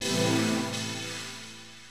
SYNTHPAD, HOUSE, 125, A

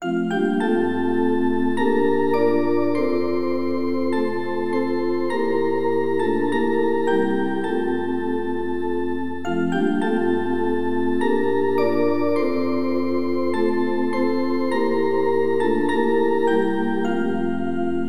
MELODY

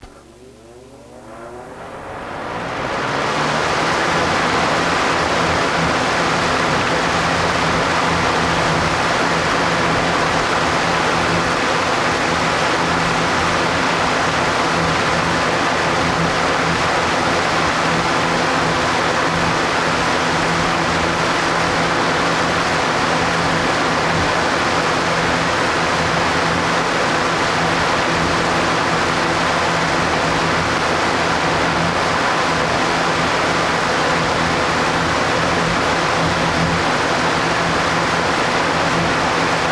FAN; WAV Sound Effects; houshold; utility

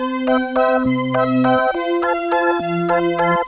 melody lp

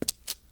Stone; kult

